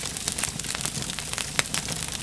Feu

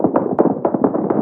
Quelqu'un qui coure sur un plancher

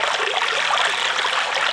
Riviere

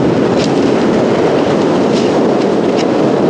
Vent dans les arbres

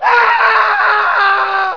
Cri d'une chute

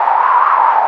Vent de tempete

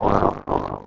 Grognement

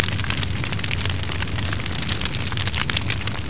Feu

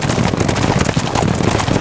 Galopade

